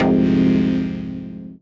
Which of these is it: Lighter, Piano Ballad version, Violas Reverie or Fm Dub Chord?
Fm Dub Chord